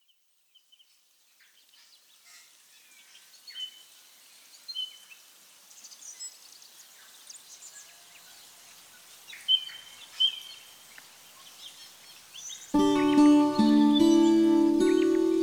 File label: ambiance vocals